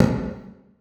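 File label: conga muted; Percussion; Sonatina Symphonic Orchestra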